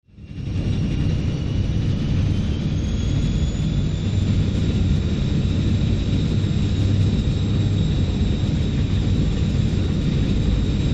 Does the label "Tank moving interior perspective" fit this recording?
Yes